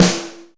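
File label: snare drum room; East West; Global Sounds; RY30 DRUMS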